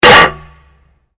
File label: Glass pane impact no break; Material components for constructing more complex sounds